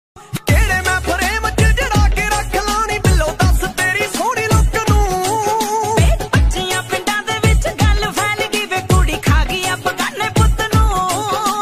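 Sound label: panjabi ringtone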